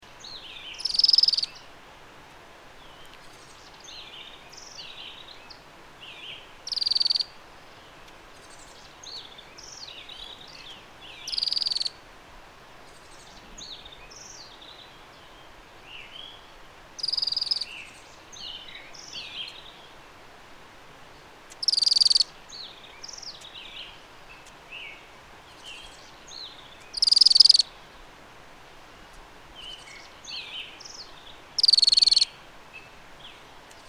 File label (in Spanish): Rayadito, sonidos de la patagonia